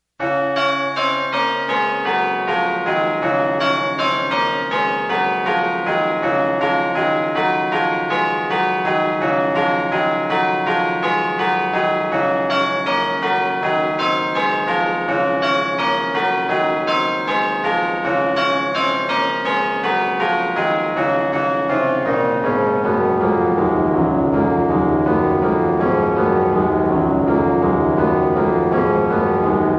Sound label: celebration peal American Bells